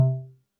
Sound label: ui error